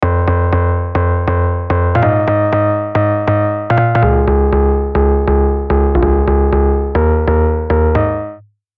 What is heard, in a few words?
Bass Call Me, Deep House One-Shot; Unison Deep House One-Shot Collection